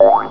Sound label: BOINK, CARTOON